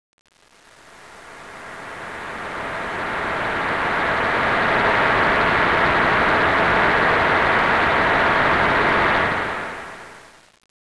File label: pad; Corel CD Power Pak: Sound Clips